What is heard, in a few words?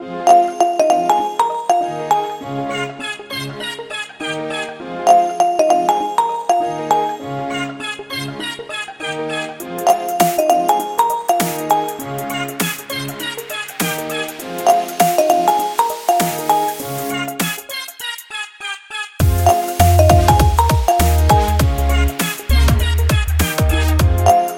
Kit Full Loop